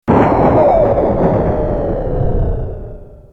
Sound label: explosion; maoudamashii